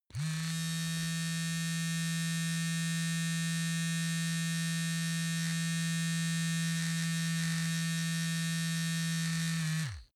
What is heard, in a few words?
razor smooth long